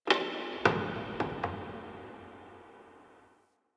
Wooden Creak Small; Warframe; Ambience; Nineteen Ninety Nine

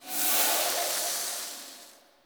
Wolf Howl Buffed Entity Attach Loop End; Powers; Orengall

